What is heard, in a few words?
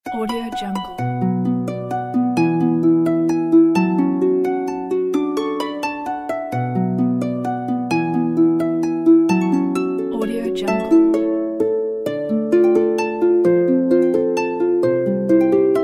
leprechauns dance